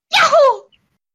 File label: yahoo